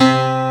PIANO, HOUSE; WORS STATION

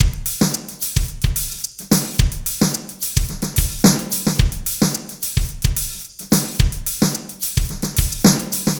hats 109

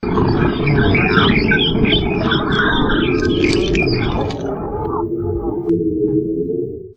beast chirp; Sonic Foundry (Sony Creative Software); Ugly Remnants VOL-2; UR 2 FX (Long)

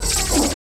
BUZZ; STRANGEFX; Total Control - Action Cutz

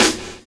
OPEN FIRE; Univers Sons - Kitch 70s

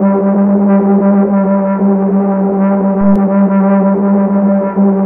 drone; Spectrasonic Distorted Reality 1; JAKE DRONE

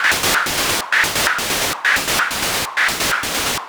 Gated Seq Noise, Gate Filtered 130BPM

